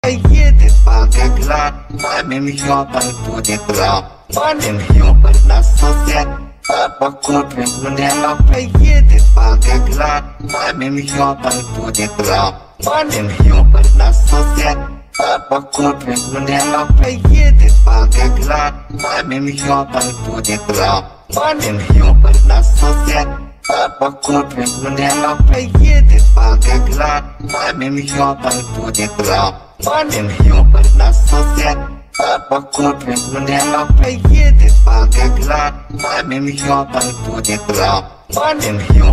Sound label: Phonk Remix